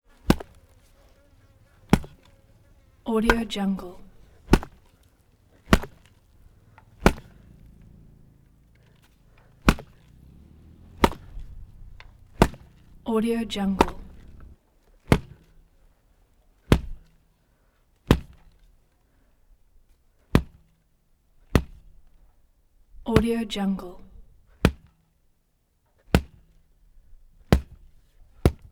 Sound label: pig hits body impacts with baseball bat